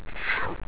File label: tchack